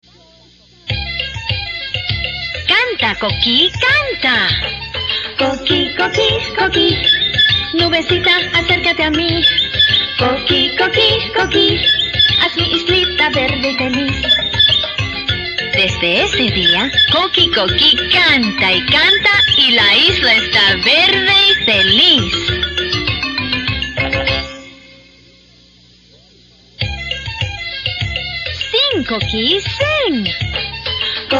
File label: Songin Spanish and English